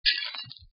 glass break

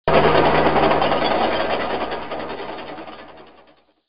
platform moving

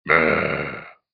Growl; Gargamen

